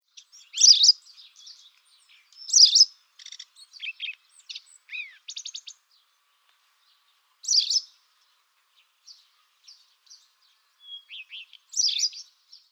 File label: Motacilla alba